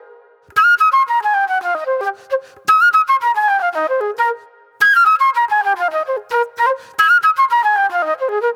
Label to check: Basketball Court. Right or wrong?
Wrong